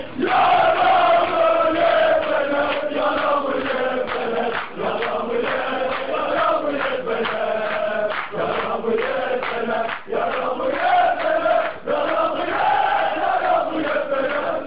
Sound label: Opera